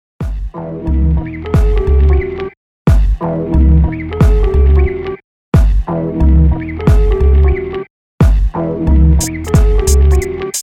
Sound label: Inst